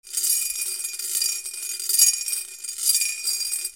FALLING

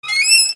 SQUEAKS

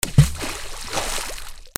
WATER

